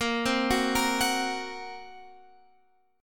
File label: A sharp Suspended 2nd Sharp 5th A sharp